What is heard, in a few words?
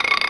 steam ATTACK; The Elder Scrolls III - Morrowind